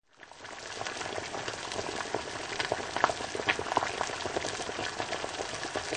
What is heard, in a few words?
water sound